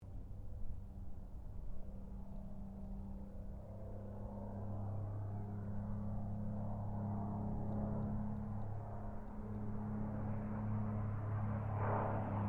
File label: AIRCRAFT P-40 KITTYHAWK PASS STEREO